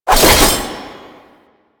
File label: Passive Sickle Spin; Warframe; Warframes; Dagath